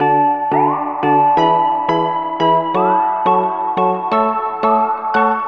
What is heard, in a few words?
Happy Melody Loop - 175 BPM F# Min; Cymatics; Oracle Sample Pack